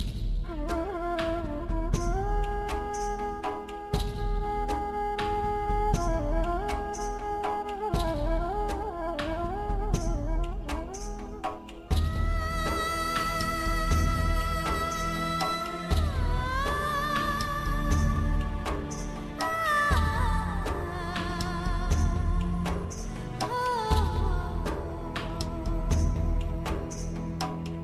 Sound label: Instrument